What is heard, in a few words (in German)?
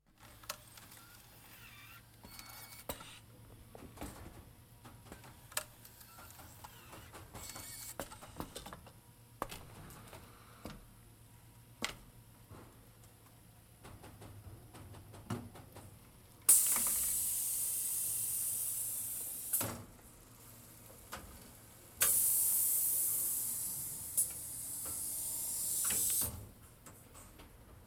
Grossstadtgeraeusche Fabrik Mit Automatischen Maschinen